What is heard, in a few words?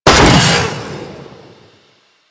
Saw Blade Launcher Fire; Warframe